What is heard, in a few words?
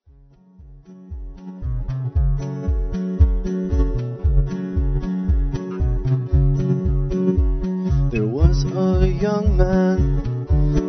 harmonic